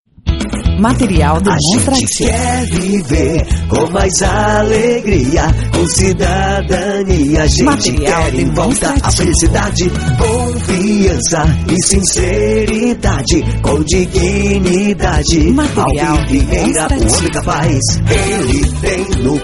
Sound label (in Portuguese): Jingle politico